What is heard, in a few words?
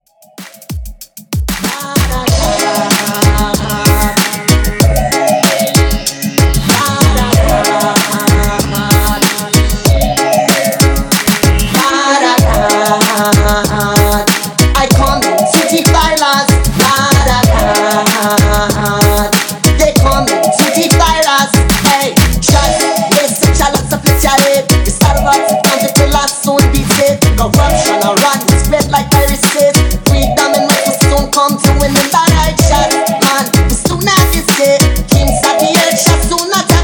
90s Reggae Redrum 100